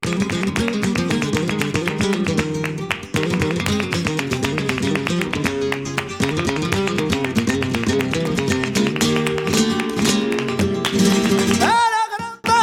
buleria, flamenco

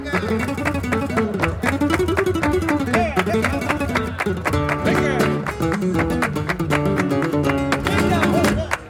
Buleria, flamenco

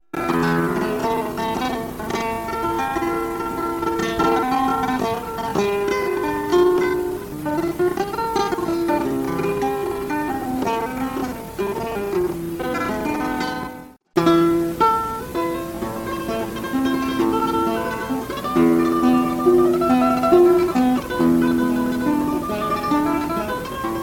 solea falsetas, flamenco

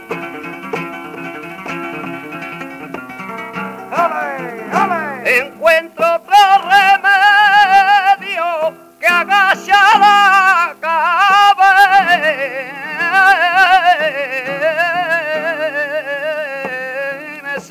solea, flamenco

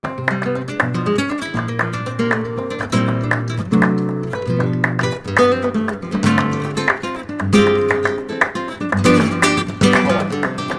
falseta, flamenco

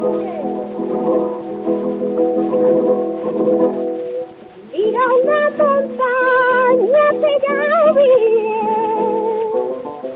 Solea, flamenco; de La Andonda

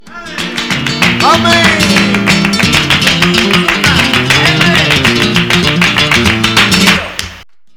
tango, flamenco

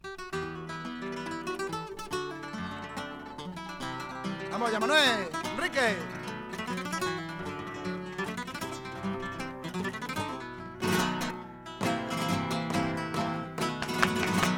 tangos, flamenco

